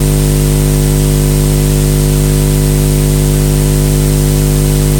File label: noise amp